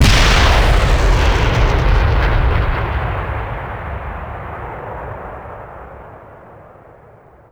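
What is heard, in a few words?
axis bazooka soldier; WAR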